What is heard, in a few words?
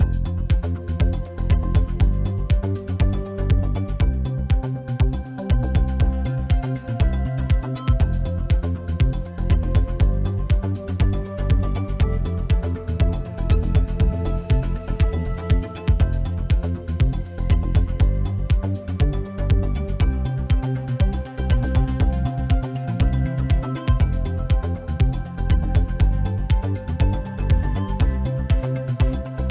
free games ambient; Lucky Fortune; Wazdan